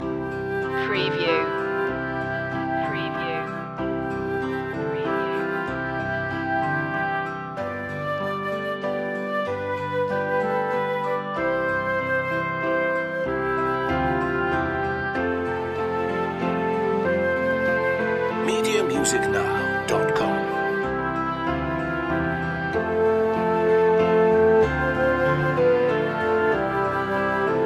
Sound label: orchestral loop; silent night; media music now